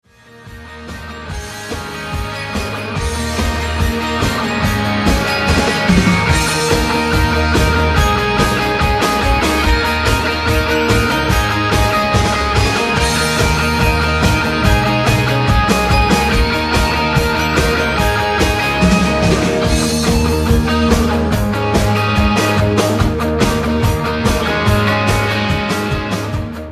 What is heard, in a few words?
lp studio